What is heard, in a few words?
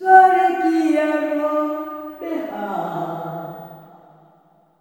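LULLABYE, SOLO FEMALE; Spectrasonics; Heart of Africa 2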